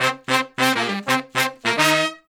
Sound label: Horn Section